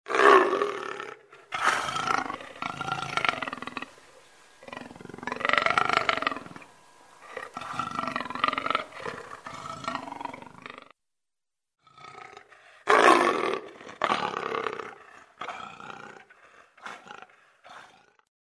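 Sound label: sounds of leopard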